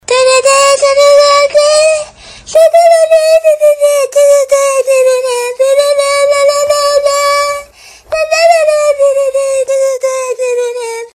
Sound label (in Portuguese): tedede dedede musiquinha meme